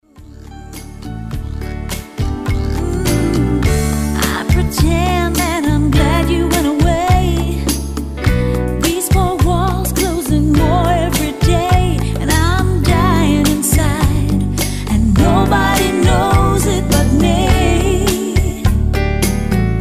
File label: Ballroom Rumba